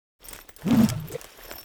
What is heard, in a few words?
Overhead Smash Attack Whoosh; Enemies; Warframe; Grineer Nightwatch